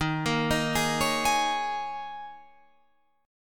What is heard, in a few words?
D sharp 7th Suspended 4th, Guitar, Open F; D#7sus4; arpeggiated